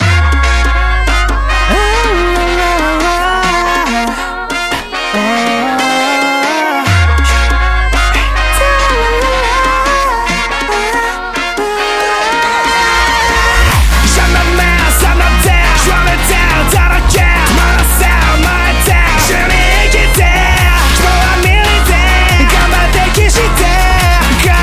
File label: Brazilian Phonk